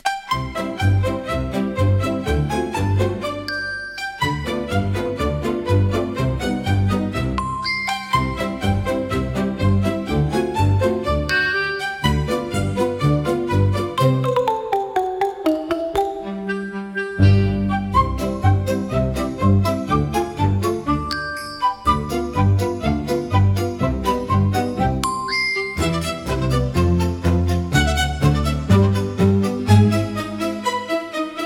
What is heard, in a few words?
fantasy music cute bright